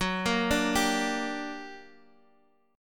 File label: G Minor Major 7th F sharp